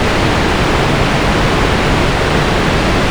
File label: waterfall; Soldier Of Fortune II Double Helix; XBOX